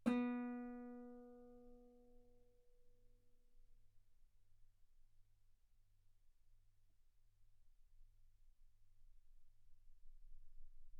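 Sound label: Guitar ff B string B3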